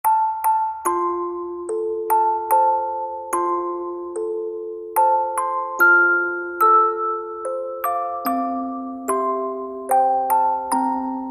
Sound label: Wiegenlied (Lullaby) [Music Box]; Brahms